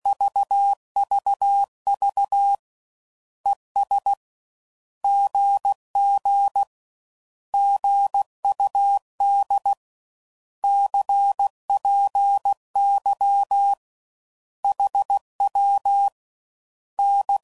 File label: Words, CW Code Groups 15WPM; Morse Code